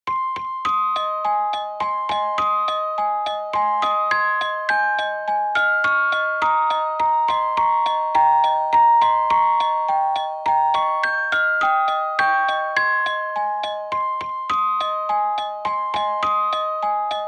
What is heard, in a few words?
Lullaby, Ice Cream Truck